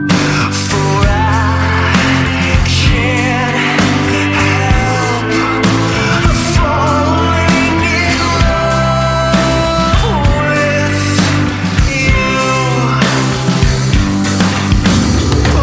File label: english ringtone